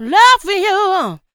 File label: LOVEVOX, Funky Vocals, SHOUTS; USB Soundscan vol.18